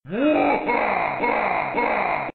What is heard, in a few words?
zombies laugh; games; ringtones